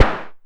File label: Perc Hit, Noise